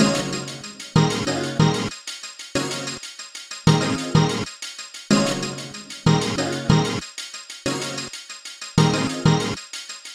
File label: Synth Stem